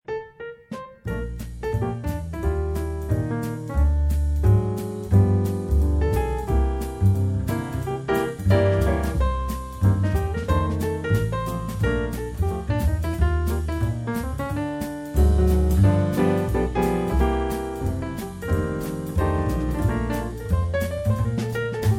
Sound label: piano bass drums; jazz